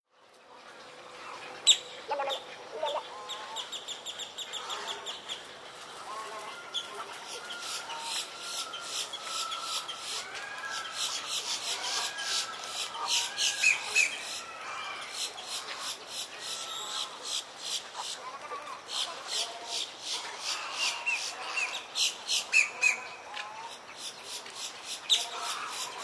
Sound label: Marsh Ambience Florida Evening; A dense marsh or swamp ambience with many birds, insects, frogs.; Environmental Sound Effects